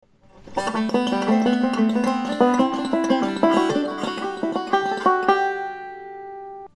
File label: scale